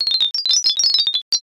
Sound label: pachinko